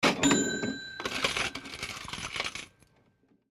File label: cashing